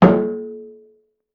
Barrel Hit Hard Ring; Vintage Cartoon; Drums & Percussion

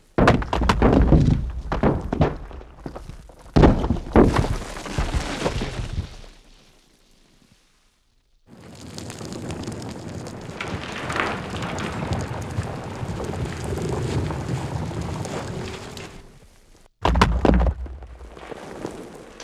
Landslide With Rocks

